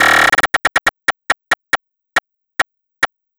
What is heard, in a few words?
Fill Break, Synchro Funk, Beats; Sonic Foundry (Sony Creative Software)